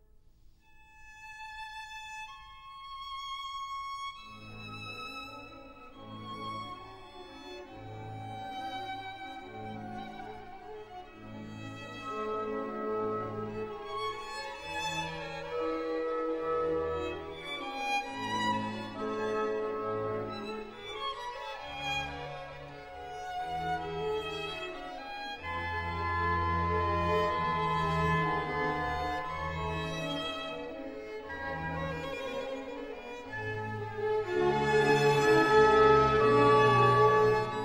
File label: mozart vln